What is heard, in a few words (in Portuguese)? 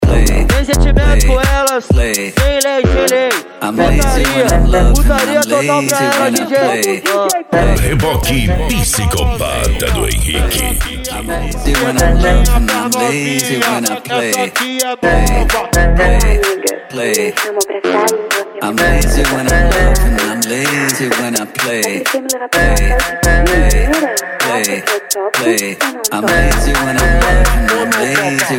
eletro funk